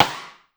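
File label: Rim Up, Drum Machines Clavia DDrum-AT Pitch Envelope; 300 Drum Machines; Pitch Env Various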